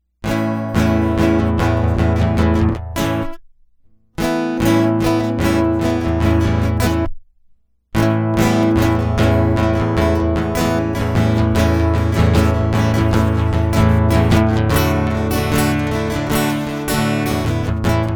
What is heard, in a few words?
Guitar Loop